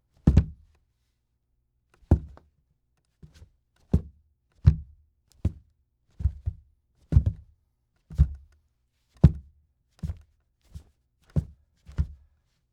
SOCKS WOOD DECK FOOTSTEP SUDDEN FAST MOVEMENT FIGHT STRUGGLE PSYCH OUT; Unusual Footsteps and Movements; Filmnoise